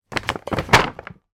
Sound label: foley bottle wine place into paper gift bag